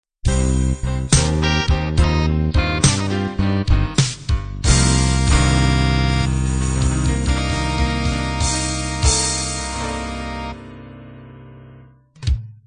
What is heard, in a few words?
slow blues end